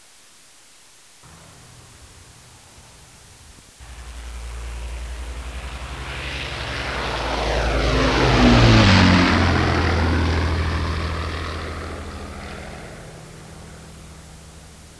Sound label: Avro Lancaster, avions